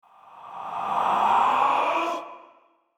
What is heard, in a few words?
Ghost Scream, Ghostscream2; Ghost Scream , Medium Pitch; Horror